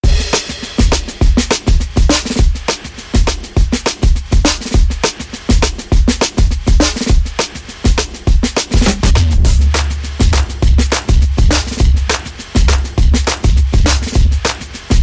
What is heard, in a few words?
Beat